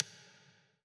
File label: Button Bottom Bar Click; Custom UI Sounds; Dying Light 2 Stay Human